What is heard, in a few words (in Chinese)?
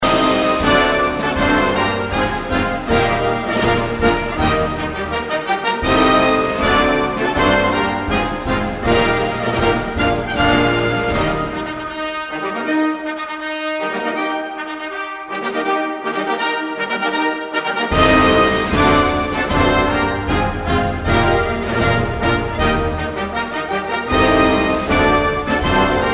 婚礼进行曲